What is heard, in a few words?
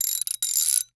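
Ratchet; 1001 Sound Effects; Tools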